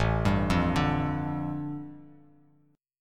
A 7th Suspended 4th Sharp 5th, A, down, Bass Standard; A7sus4#5 arpeggiated